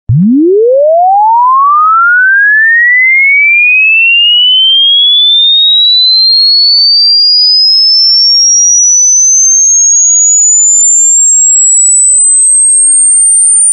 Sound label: danger sms